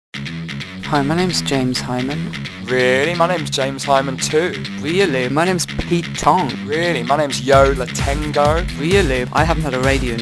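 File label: ident with music; Rinse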